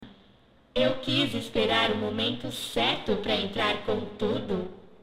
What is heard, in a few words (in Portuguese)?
jumpscares dublados; da ultimate custom night; fnaf; huestation